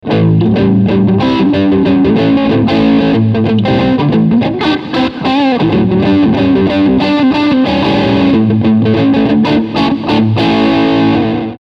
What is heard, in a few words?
Crunch Steg Mid SC